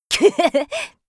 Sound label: laugh; Eternal Return; Hisui; KOR